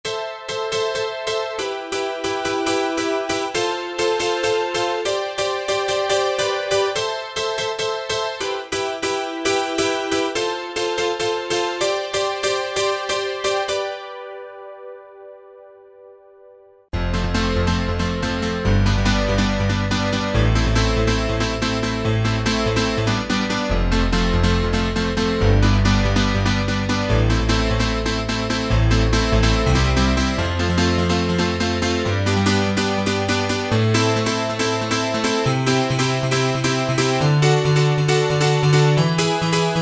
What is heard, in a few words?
90's Piano, Korg TR-Rack